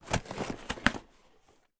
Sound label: foley cardboard box section rip tear squash